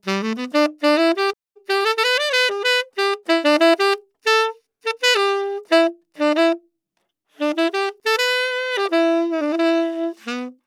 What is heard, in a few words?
Sax Original